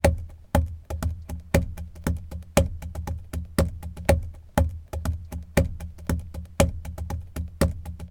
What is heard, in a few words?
steering wheel tap person rhythmic; transportation; sound effects; sound effects one